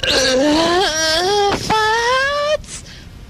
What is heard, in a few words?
whine; ooh ahh; human